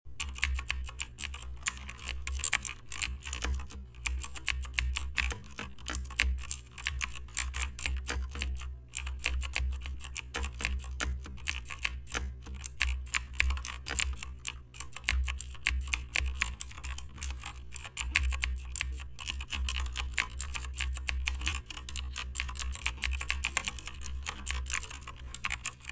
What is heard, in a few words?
keyboard typing sound